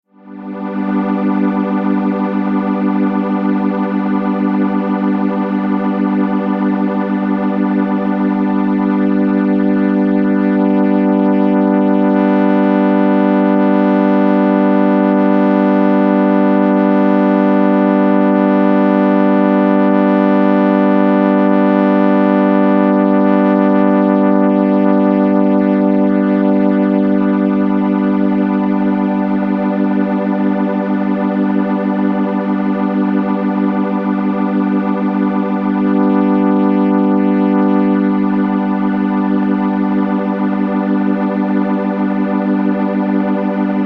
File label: string ensemble, triple chorus; waveform adjust